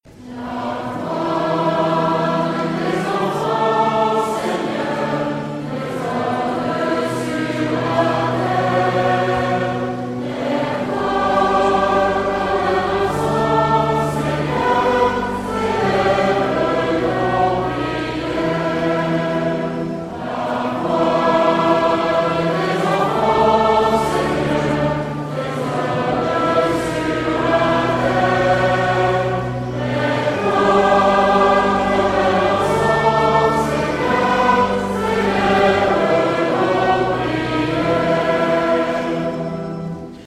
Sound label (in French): Tutti; La voix de tes enfants